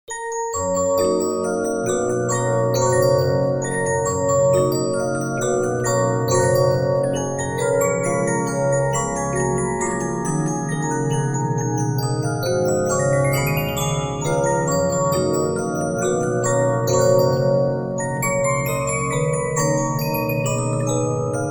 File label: Regina, 15inch disc musicbox